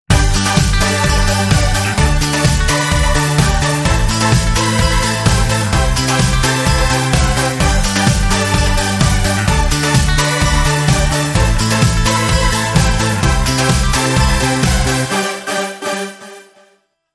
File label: Jingles